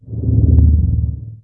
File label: energy